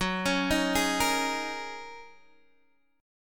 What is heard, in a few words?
Gb 6th Add 9th Gb down Guitar Open G